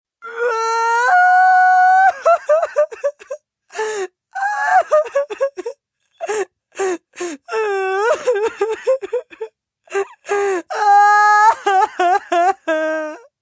Cry Wailing, Cry, female, wailing, pain, hurt, sad (human voice); Crying Sound Effects